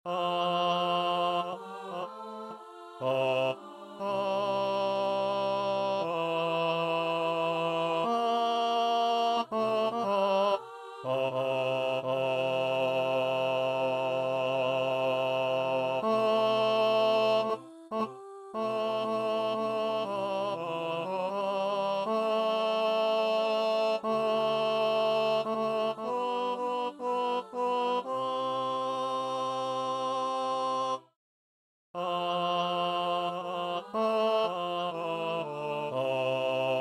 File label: tenor